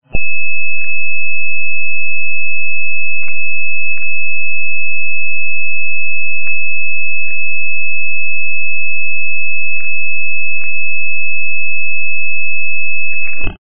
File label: A Lot Of Noise